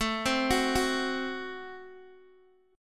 A Minor 6th A Guitar DADGAD

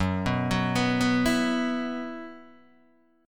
F sharp 7th Suspended 4th, Guitar, DADGAD

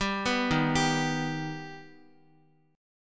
Eb Augmented Eb, Guitar; Eb+ arpeggiated; JGuitar

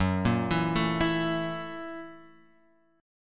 F sharp 7th Sharp 9th; F#7#9 Chord {2 1 2 2 X 0} arpeggiated

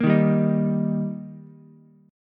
D sharp Major D sharp Ukulele, chord; strummed; 3,3,3,6; JGuitar

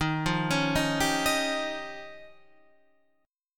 F 7th Suspended 2nd Sharp 5th

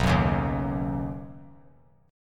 B Major Add 9th B, chord; Badd9; strummed; JGuitar

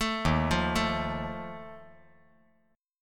D sharp Suspended 2nd Flat 5th, chord; arpeggiated; JGuitar

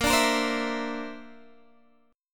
D 7th Suspended 2nd Sharp 5th A sharp

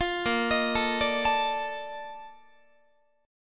Bb Major 11th Bb Guitar; arpeggiated

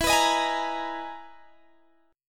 E Major Sharp 11th, Guitar, Standard; strummed; JGuitar

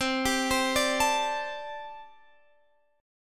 G Suspended 2nd Suspended 4th

